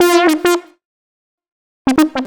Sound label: lead (brass)